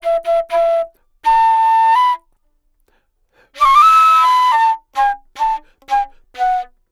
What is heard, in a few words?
Wood Flute, Asia; Sonic Foundry (Sony Creative Software); Continental Drift Aftershocks